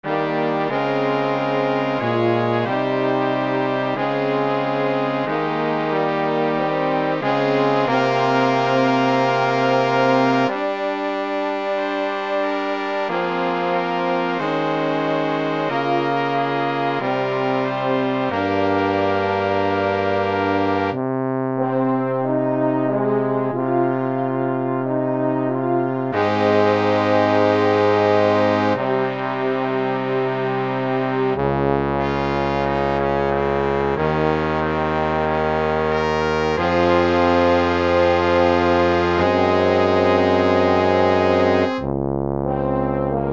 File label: brass quintet